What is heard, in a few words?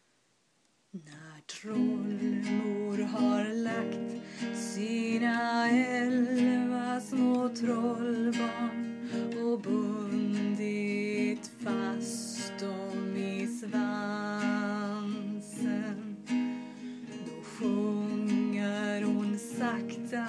trollmors vaggvisa lullaby